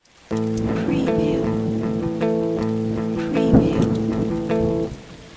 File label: Rhythm 6-8 Slowrock Am 120bpm No Effect, electric guitar; beatcraftpro